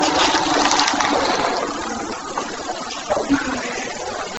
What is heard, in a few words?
Car driving by then voice saying MaryAnn; EVP; The Hoffman Mansion; Spirit Chasers of the Unknown; Hoffman House Evp 10